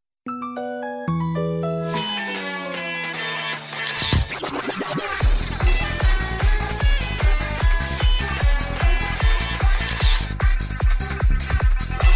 remixed nokia ringtone, sms

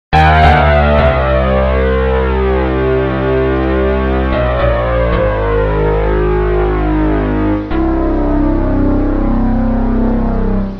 electronic piano ringtone

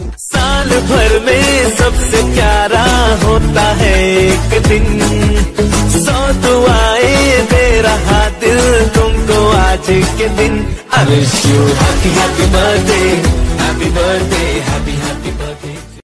happy birthday ringtone, bollywood ringtones

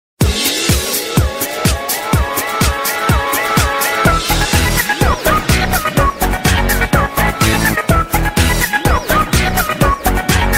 best music ringtone

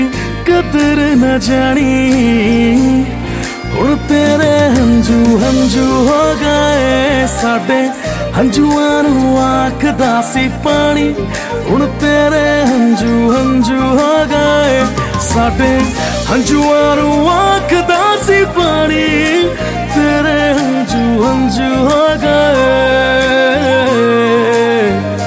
bollywood remix ringtone

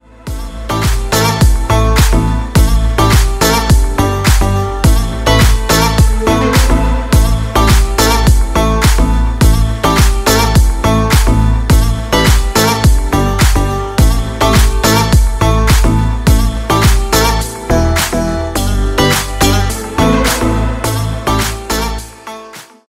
spanish dance ringtone

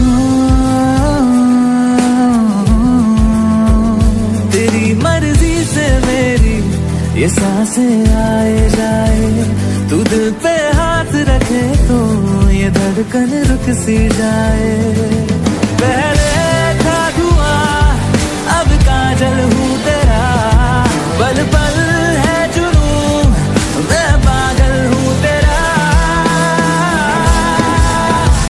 hindi love ringtone, bollywood ringtones

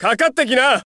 taunt ringtone

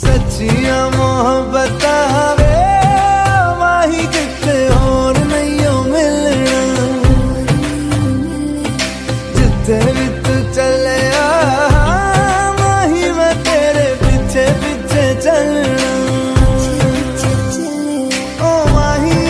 sweet bollywood ringtone

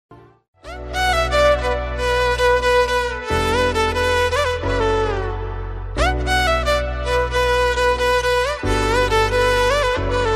romantic background music ringtone

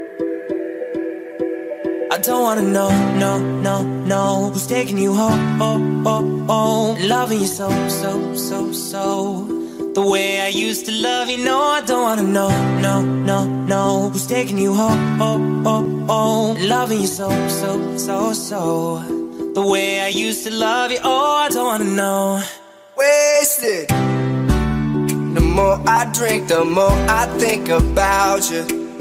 hollywood song ringtone; english ringtones

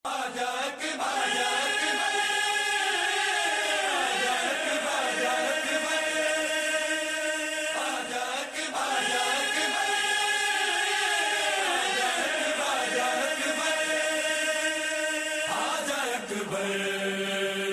noha ringtone

